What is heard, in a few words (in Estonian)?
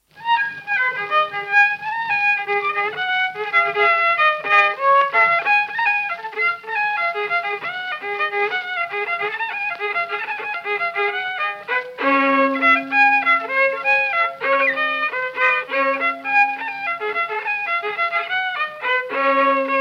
Kuusalu viiul folk; Viru mage; Gustav Lindstrom